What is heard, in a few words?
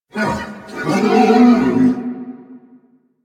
horse trot breath; Vehicles; Duviri